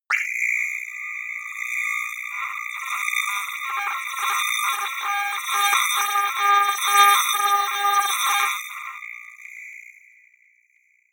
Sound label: creepy modulator long